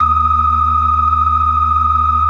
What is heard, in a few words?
FAST VIB, TREMELO+VIB, Synth and Organ